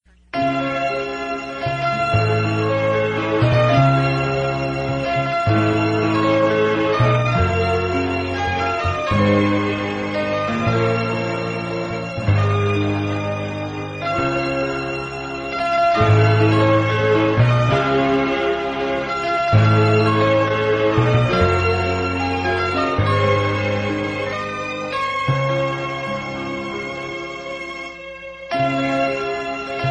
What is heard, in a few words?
Instrumental